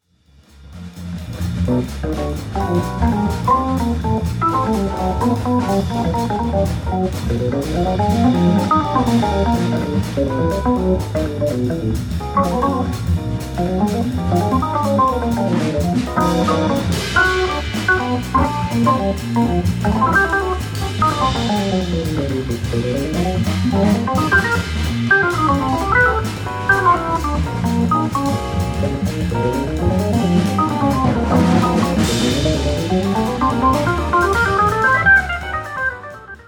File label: orgues Hammond